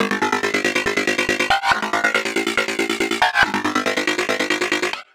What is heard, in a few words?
Lead, Dubstep and Trap